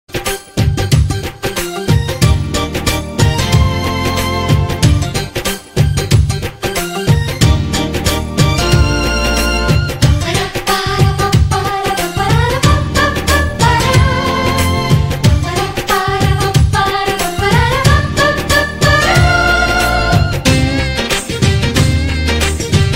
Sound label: bgm instrumental; Student No1; NTR